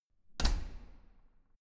corridor door close normal

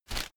whoosh shredded paper soft; swooshes-swishes

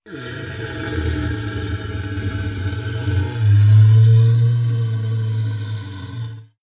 monster inhale low; kreaturen

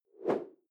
whoosh simple short tonal; swooshes-swishes

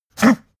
pug gag short; natur-tiere; hunde

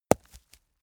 stone impact medium; natur-tiere; steine

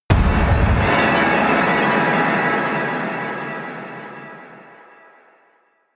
bat hit, Metall; hits explosionen

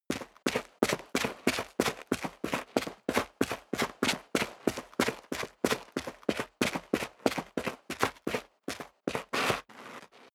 step sneaker concrete grit run sequence

